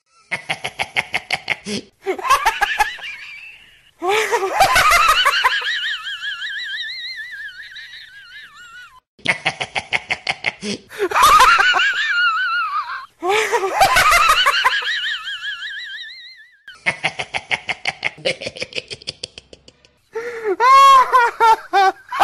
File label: crazy laughing